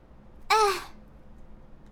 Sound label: Hurt, human girl villager; customnpcs